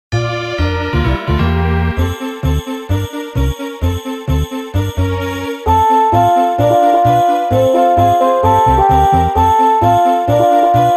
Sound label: Snow Theme